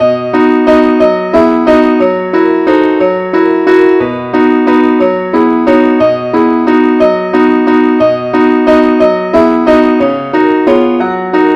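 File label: advance accompaniment key b; Angel voices, ever singing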